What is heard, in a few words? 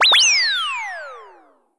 whee; Umineko